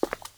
Tile Foot Step

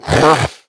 Whistlers VO Hurt; we are the dwarves gamerip 2016

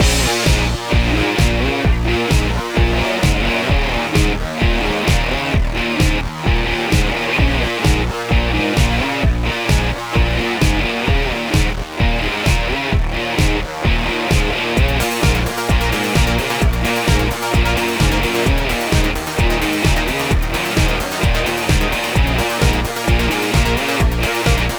The Beat; Asphalt 5; android

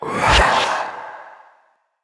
Shadow Shift; We Are the Dwarves

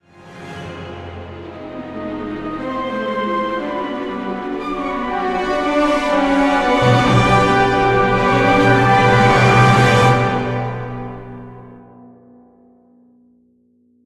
Evacuation Stinger; mass effect 3 citadel gamerip 2013

